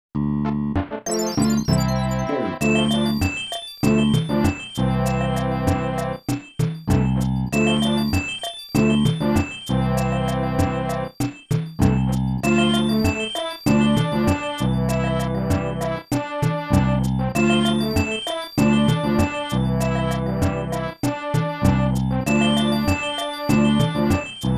Underground BGM; super mario yoshis island

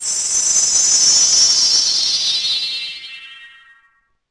Teleport; Space

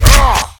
attack

